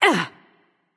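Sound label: grunt attack, female; smite; voicepack; hera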